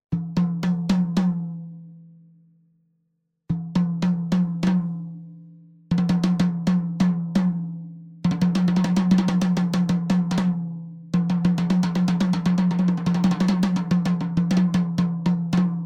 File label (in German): Fame MS Drumkit EZ Toms 10 12 14 zusammen